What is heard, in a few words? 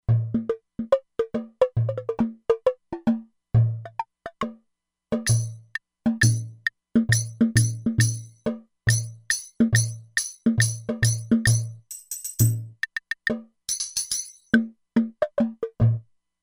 Early Perc; Factory Presets; E-mu Proteus-2